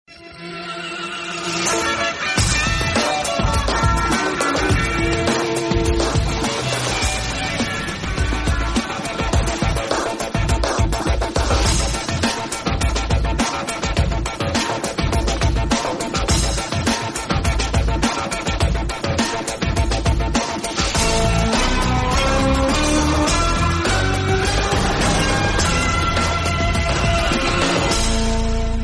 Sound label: cool classical cell phone tone ringtone; iphone ringtones